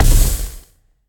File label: poison hit; smite; serqet